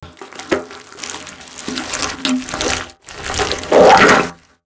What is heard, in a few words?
vort remove extract; Garry's Mod; outland